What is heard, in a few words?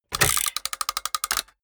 mechanics small rattle